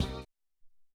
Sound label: Tek Hit